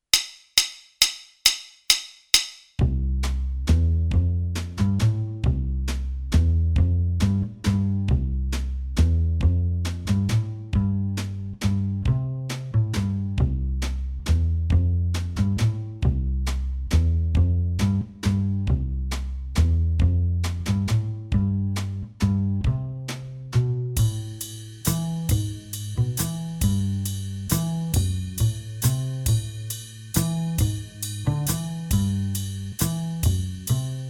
Whimsical Waltz Track

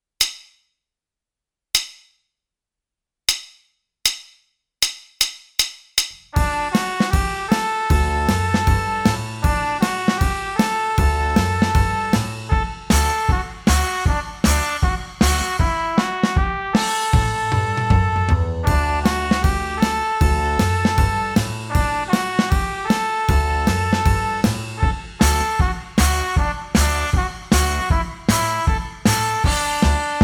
Take It Trumpet Track

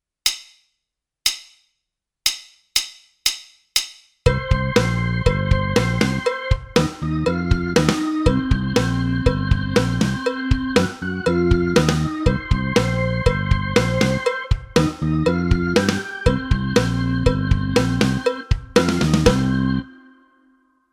Rock it Launch Track

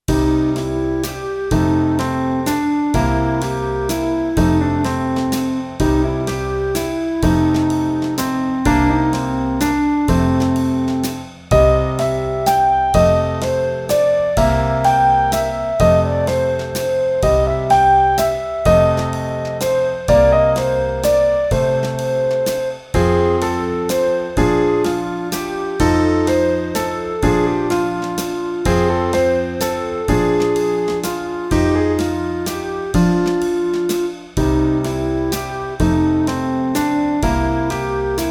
Three Quarter Blues

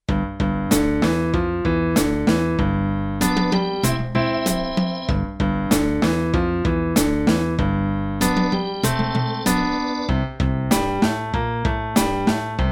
LH Boogie in G

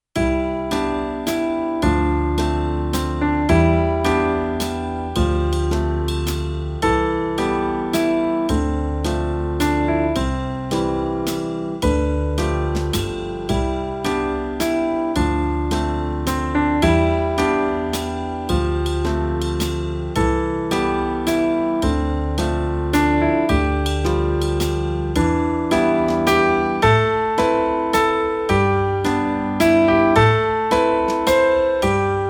Midnight Waltz

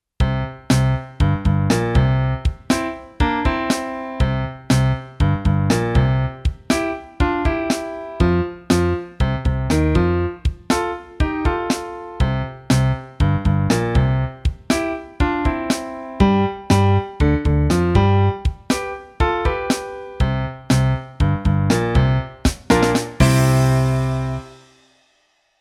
Upbeat

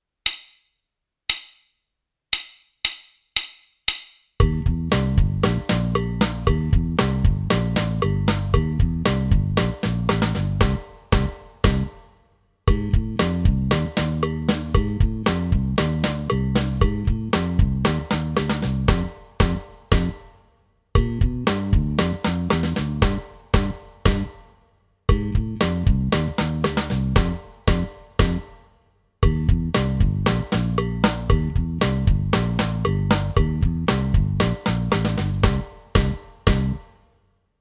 Foot Stomp Blues Track

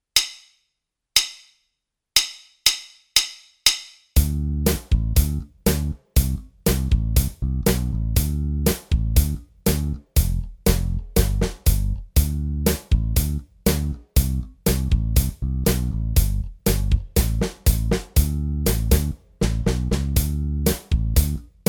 Soulful Rock Track

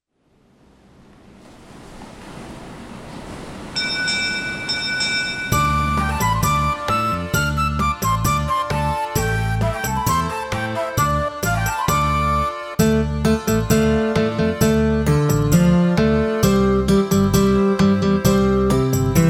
Sea Shanty

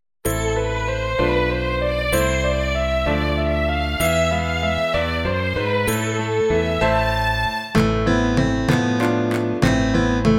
Tarantella

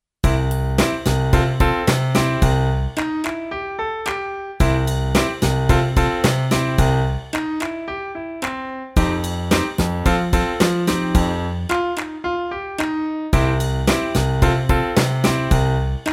Bucket of Blues

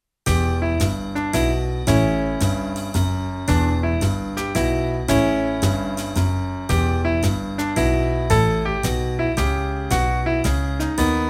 Swing

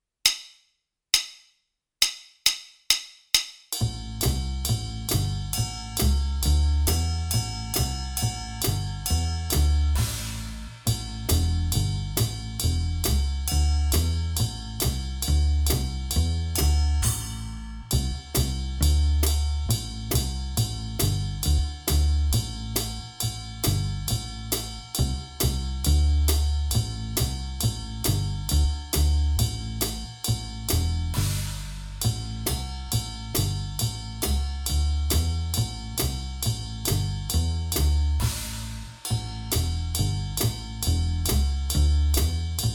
Jazzy Jive Track

